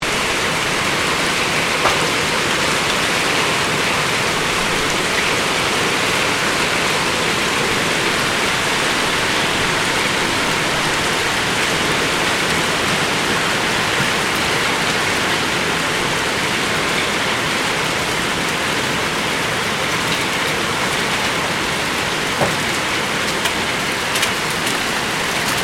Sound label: HAIL VERY HEAVY STEREO, Severe hail storm